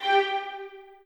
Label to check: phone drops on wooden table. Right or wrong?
Wrong